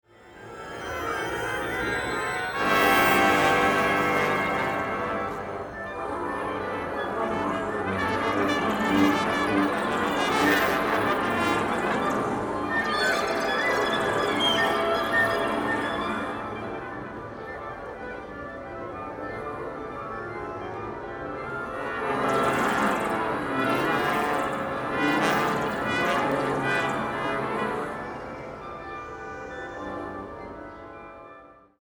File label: sparkler